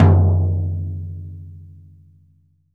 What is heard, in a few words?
LTOM P; VELOCITY TOM 2